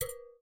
Door Count; game audio; Chibi; MiSide

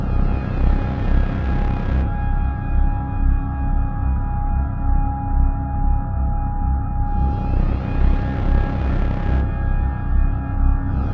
Ambient Pause Evil; Game rip; MiSide

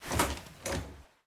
Open Door; MiSide

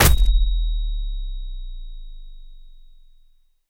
Player Kick; Game rip; MiSide

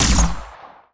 Shot; MiSide

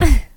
Damage; MiSide

